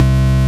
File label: M-Audio Venom Physical C2